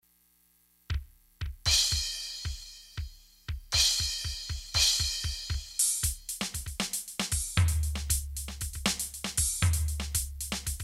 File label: funk, grooves